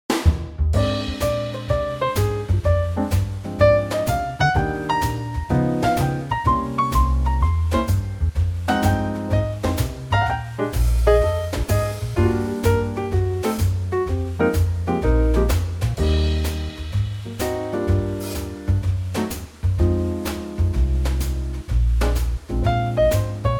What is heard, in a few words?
Eb to F trio